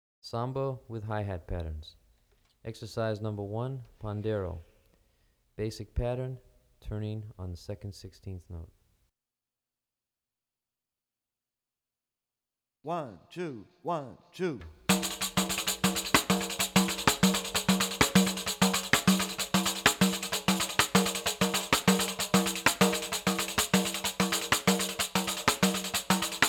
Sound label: Samba with Hi-Hat Patterns; Brazilian Rhythm For Drumset; DaFonseca, Weiner